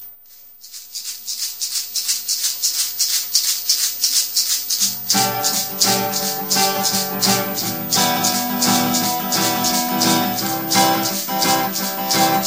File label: reggae